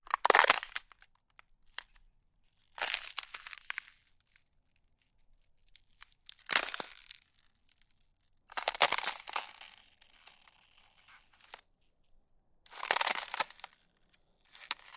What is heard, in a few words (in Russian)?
zvuki shagov po ldu